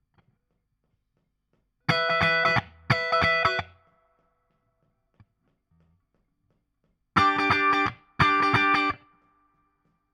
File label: Guit Solo Back